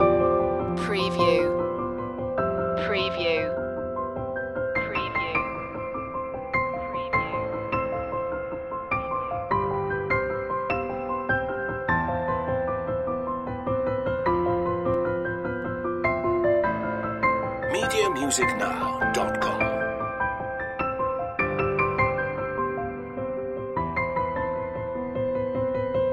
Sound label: love and heartbeats loop